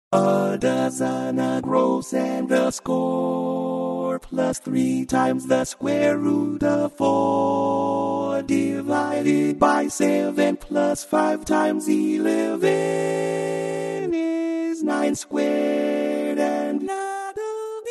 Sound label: Lead; Limerick